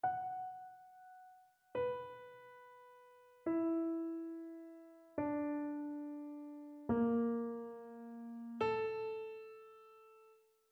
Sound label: interval chains